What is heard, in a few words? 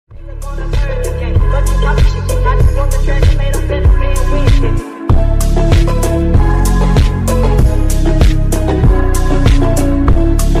Phonk